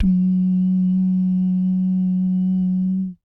BS DUMMP; Spectrasonics; Vocal Planet; Jazz FX

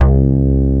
ANAL BAS C2, Bass Analog & Synth

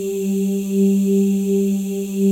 M+F EES; Spectrasonics; Symphony of Voices

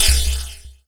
Oneshot; Sonic Foundry (Sony Creative Software); Esoterik Beatz; Quesy

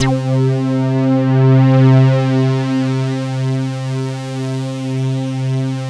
MEG, synthesizer bass; Synthesizers; Soli-Music; World of Synthesizers VOL-1

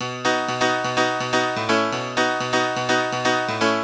House Piano (125BPM), retro house

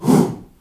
HOO, SHOUT

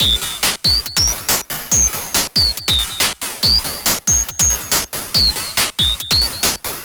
Distorted Loop, Drums & Percussion, Electronic; Sonic Foundry (Sony Creative Software) - Electronic Point Blank

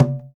GENDANG F5, GENDANG DRUM; Spectrasonics; Heart of Asia

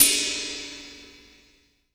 RIDE, Drum Dry; East Collexion; Drum 1 Dry

